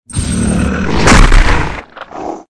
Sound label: jack o lantern attack, monster